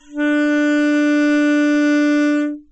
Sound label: sax synth